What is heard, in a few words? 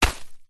footstep single shoe wood chippings pile; foley; zapsplat